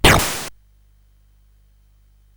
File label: Paddle Mania, CSG8580R5